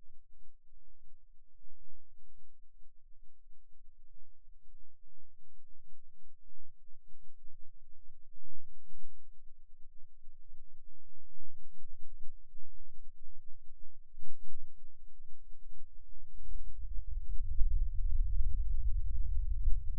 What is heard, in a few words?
Rhythm Seizure, eeg